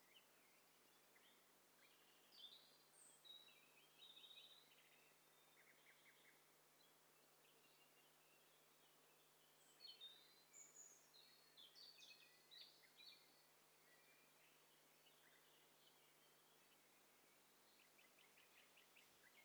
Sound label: STEREO BIRD COCKTAIL PARTY